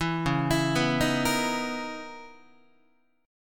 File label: Db Minor 13th Db Guitar; Dbm13 arpeggiated; JGuitar